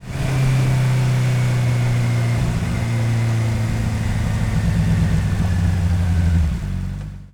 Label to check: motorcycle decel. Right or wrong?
Right